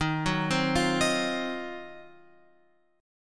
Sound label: D sharp Minor 6th Add 9th D sharp, chord